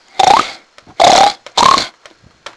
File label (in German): grunzen